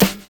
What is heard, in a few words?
VINYL SD, Drums Dance & Groove